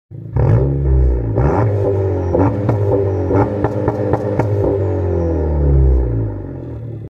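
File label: Honda Civic, exhaust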